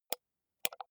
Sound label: computer mouse wheel click slow